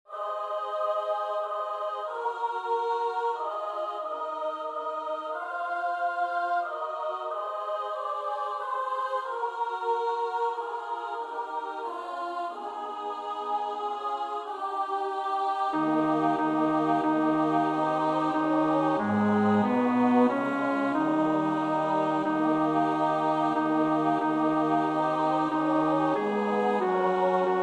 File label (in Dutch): bas; Szymanowski (Karol); Stabat Mater (John Fletcher)